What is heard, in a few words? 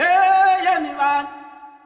male eehh yah man, African vocals sung